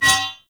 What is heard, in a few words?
Melee Impact Metal; Warframe; Weapons